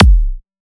new kick

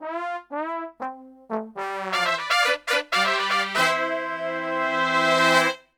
MEX C, Brass, MEXICO LICK; Best Service; Brass Super Section

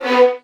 VN FX, Virtuoso Strings

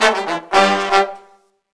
BRASS LICKS, Disco Fever

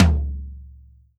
drum, mixed drums, World Instruments; Best Service ProSamples vol.52 - World Instruments 2; AKAI

